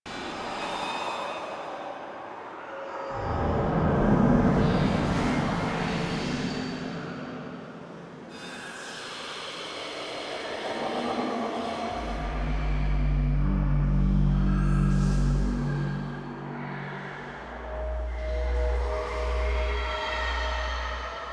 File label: Interior Bed Loop; Warframe; NineteenNinetyNine